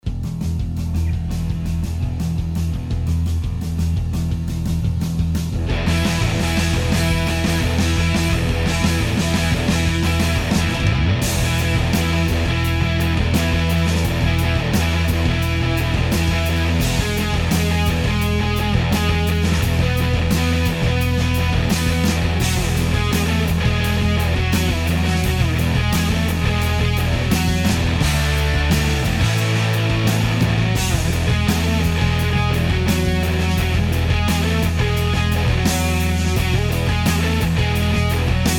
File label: Layered LP flat Tone 60 Gain